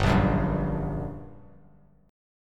G Minor Major 7th G down Bass Standard, GmM7 chord; strummed; JGuitar